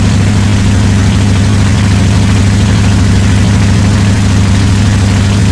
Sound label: rpm, F6F Hellcat; Bombable; flightgear